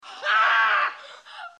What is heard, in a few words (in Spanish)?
grito hombre